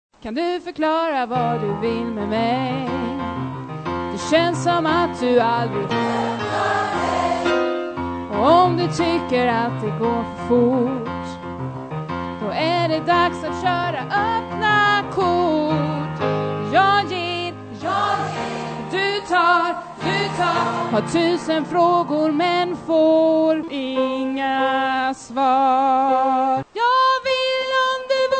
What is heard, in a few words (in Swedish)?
bas, stammor